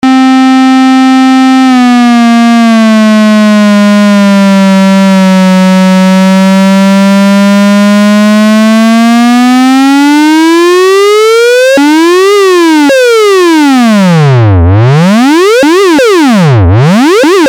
test lfo, Moog minitaur

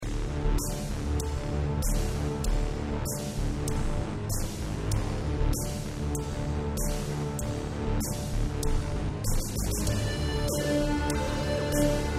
seq, wall by Yamaha SY99; yamaha sy99 synth; turrican 2; Rainbow arts (1985); Retrogames music Synth remake

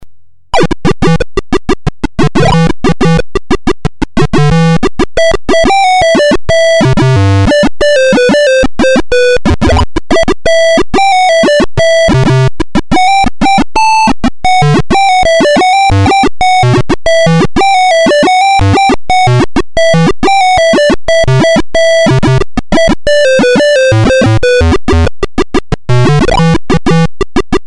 beeper, PC famous soundcards

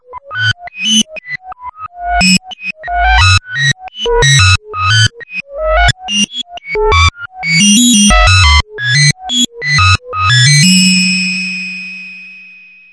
fx reverse, yamaha tx81Z

